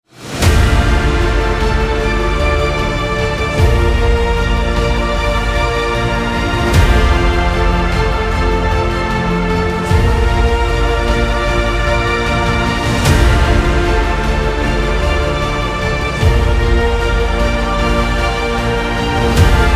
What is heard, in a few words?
epic hero